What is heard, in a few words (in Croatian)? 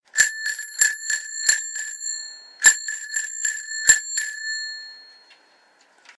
Zvono bicikla